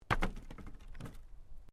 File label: Wood Planks Fall